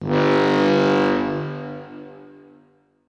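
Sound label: Aquarium Critical Stop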